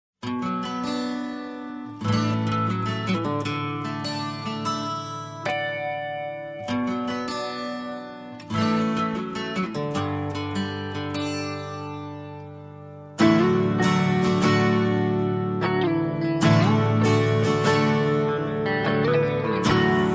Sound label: Guitar Solo